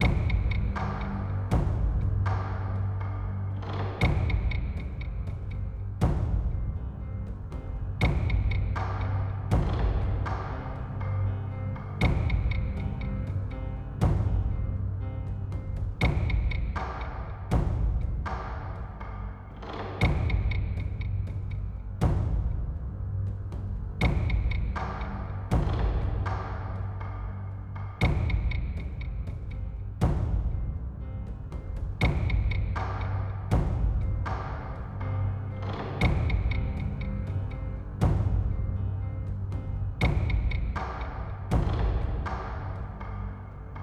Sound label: ambience serious loop